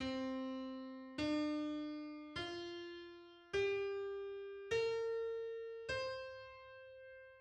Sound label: 5-tet scale on C